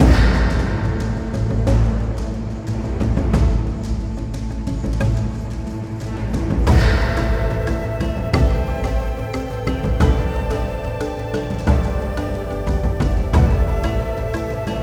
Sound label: Ori Attack Loop; Ori and the Blind Forest; Sunken Glades